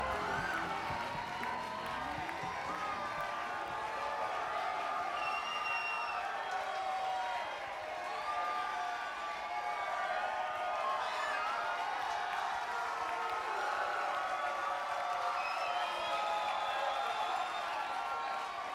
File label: Crowd; Metro Chicago IL USA